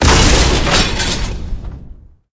Character Body Impacts

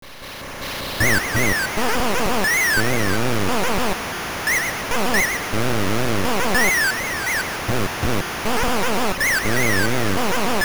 CHEER; beatmania GB Gatcha Mix2; GB gamerip 2000

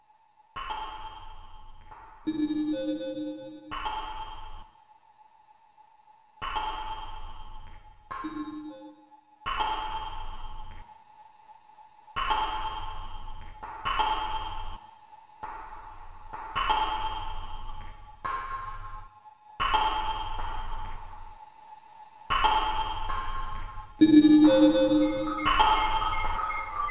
Desert; the quest for the time bird; amiga gamerip

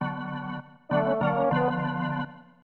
Pirates Realm Fanfare; Glover; Nintendo 64

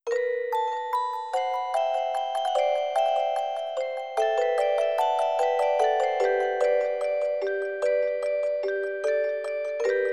Blossom chime; void stranger original soundtrack 2023

